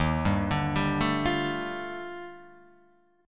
D sharp Major Sharp 11th D sharp down, Guitar Open F; arpeggiated; JGuitar